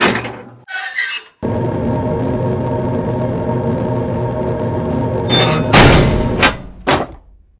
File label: Hatch Open Close Sequence